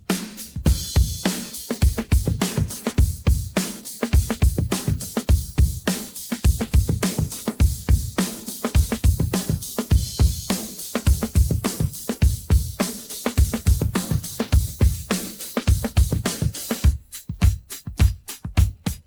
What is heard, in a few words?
pattern chorus, drums